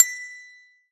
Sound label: desk bell; Scrat